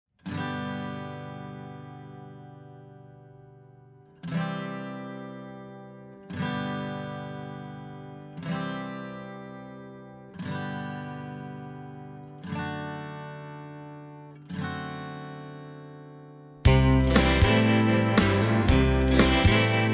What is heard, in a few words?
Instrumental Sample